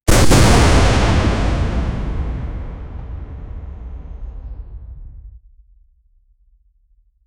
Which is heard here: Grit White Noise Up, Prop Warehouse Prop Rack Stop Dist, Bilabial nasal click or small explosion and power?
small explosion and power